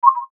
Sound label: Beep Tone Signal Multimedia